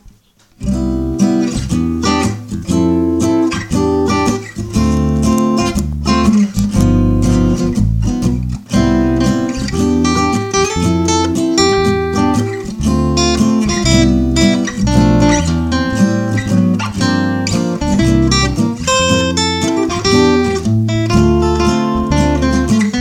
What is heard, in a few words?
jazzy Twinkle